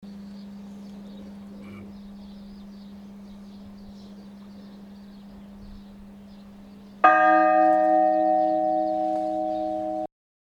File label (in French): cloche; Cleppé